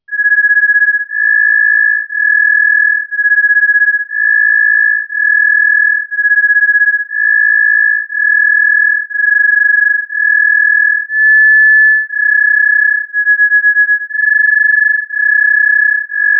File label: THROB, Hobby Radio